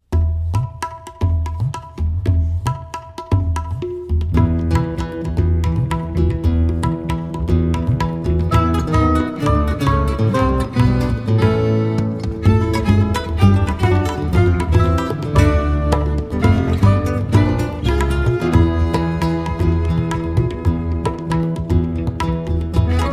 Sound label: Flamenco Fusion